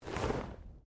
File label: Body movement Slide; Body movement foley to simulate falls and deaths; Bodies; Human